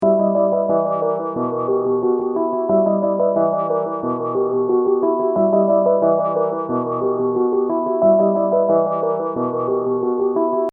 Hip Hop Dark Rhodes Licks 90 Bpm Straight E; keyboard loops

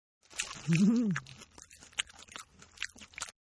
Eating Noisy Female; Human; Partners In Rhyme

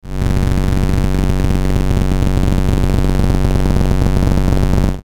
Static Rumble Noise; Production Element Imaging Element Accent Transition; Blastwave; Static Sound Effects

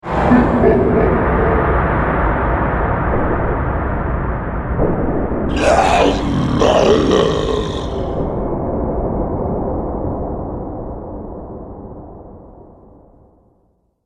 Monster Battlescape; Monster Sound Effects

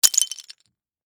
Icicle Drop Hit, Ground, Small; Weather; Snow Sound Effects; BlastWave

